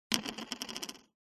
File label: token plastic set down wood slight spin; foley